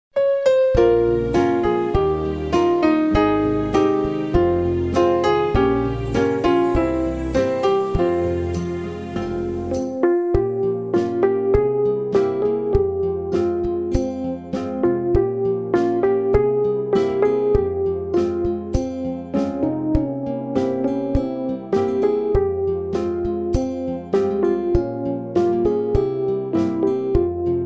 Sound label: karaoke; detskie vypusknoy